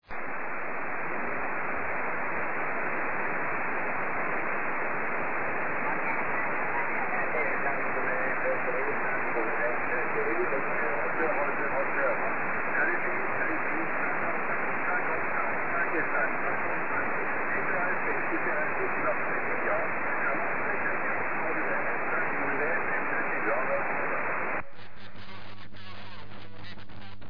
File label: 13cm ssb; EME 10GHz